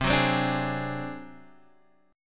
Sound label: D 7th Flat 5th C, chord